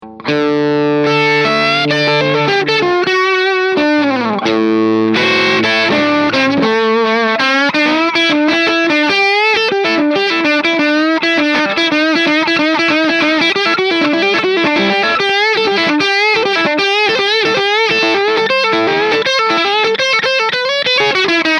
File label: Rock